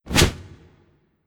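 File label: Surprise swoosh In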